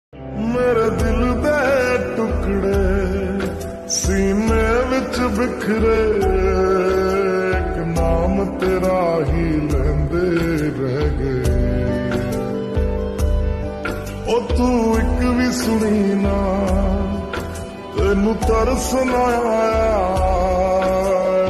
slow mo remix ringtone

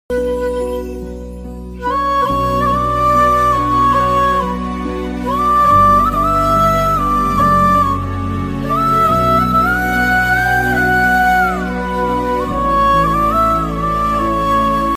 Krishna Flute peacefulmusic; radhe krishna flute

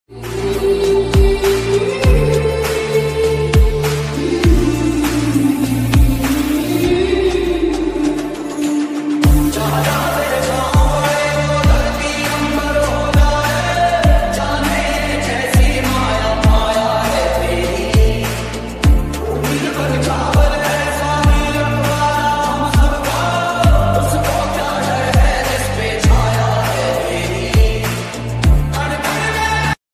ringtone songs music; bholenath

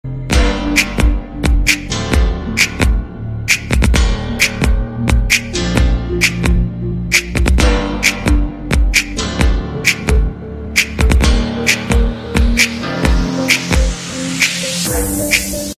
phone ringtone

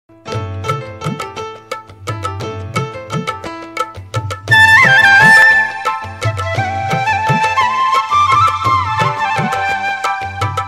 flute bgm ringtone; bombay priyudu

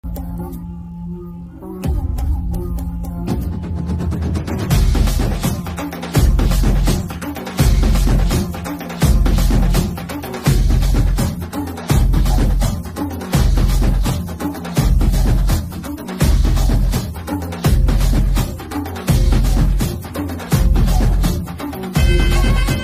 MASS BGM RINGTONE NO VOCALS; kanguva

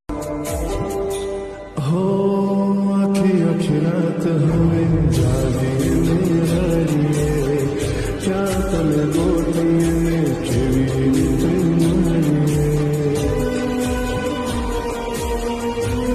Gujarati ringtone

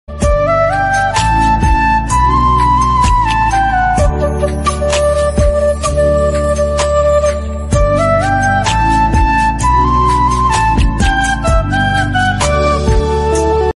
flute ringtone instrumental ringtone flute music; independence day flute ringtone

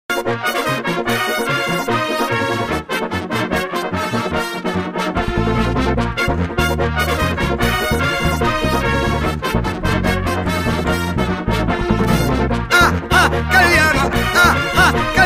wedding song tamil; indraja karthik wedding; indraja shankar marriage

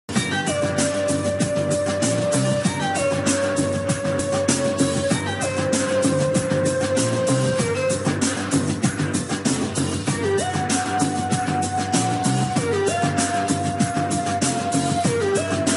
english bgm; mission impossible; goat trailer

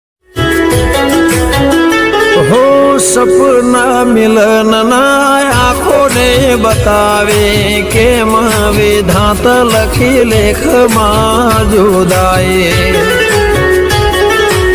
gujarati ringtone

